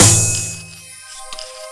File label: Percs